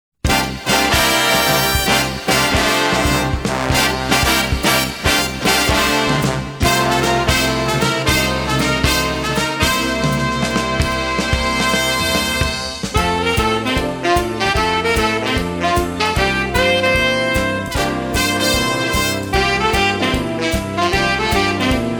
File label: Big Band Party